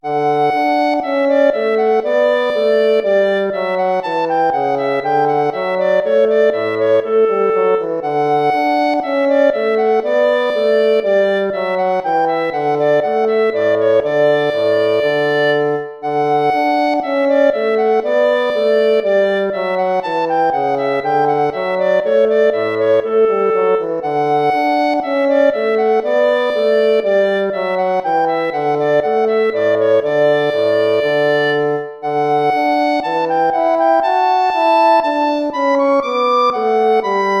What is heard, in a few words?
allegro; hook james